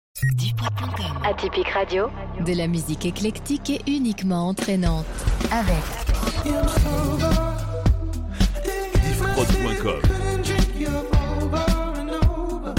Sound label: Radio Medley Pop Rock